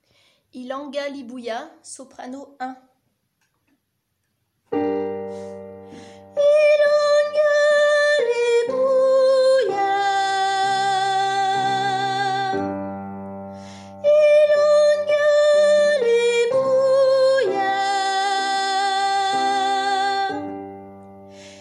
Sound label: soprano